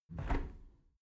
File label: window close regular